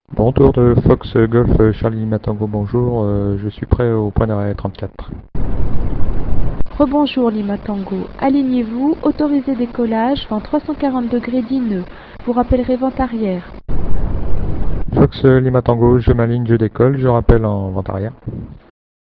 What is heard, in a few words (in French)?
decollage